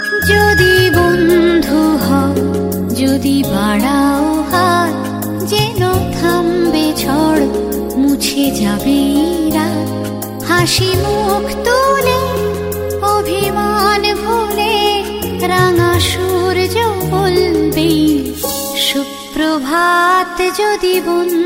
Bengali Ringtone

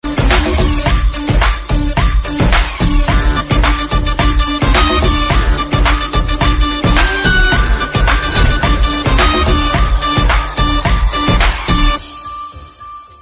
Instrumental; Gandi Baat Web Series

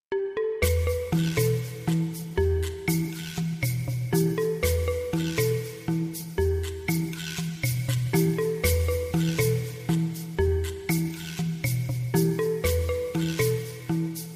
Massage Ringtone

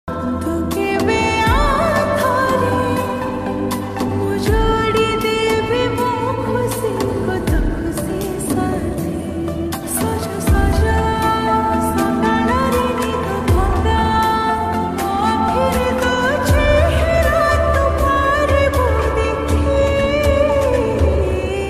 Odia Ringtone

